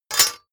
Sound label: sword block metal